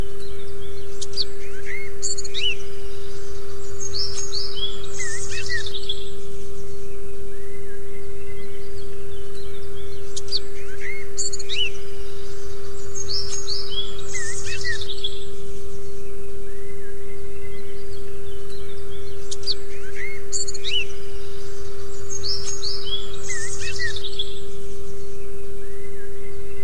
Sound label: Transmutation of fear with forest sounds